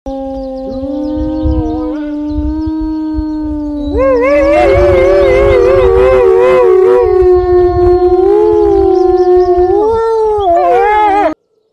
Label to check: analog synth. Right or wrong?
Wrong